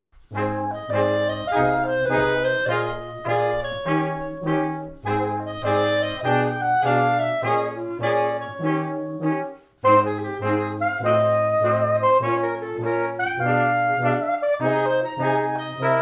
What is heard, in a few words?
Fox-trot